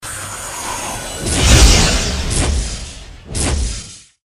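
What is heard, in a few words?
attack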